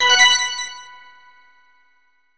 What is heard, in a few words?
Silver Coin; Diddy Kong Racing HD; N64